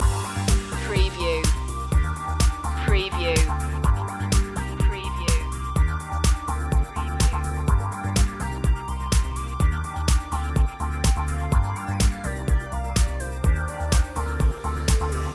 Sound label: beach side loop